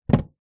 foley wooden box case set down gently hard surface; zapsplat